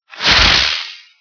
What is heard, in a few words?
bottle rocket